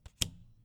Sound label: card